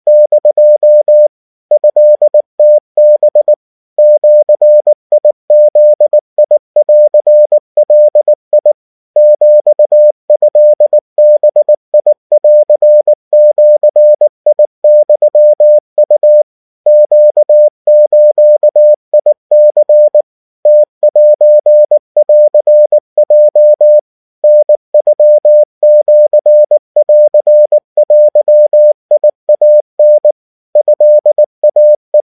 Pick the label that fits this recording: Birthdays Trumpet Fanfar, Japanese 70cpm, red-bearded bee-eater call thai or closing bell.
Japanese 70cpm